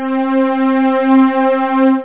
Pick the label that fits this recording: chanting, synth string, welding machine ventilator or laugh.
synth string